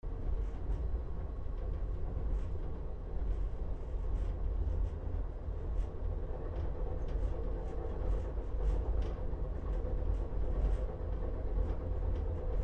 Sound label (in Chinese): 火车远行